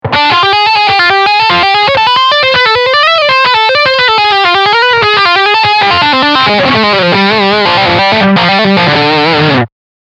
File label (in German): Lead Line Steg Humbucker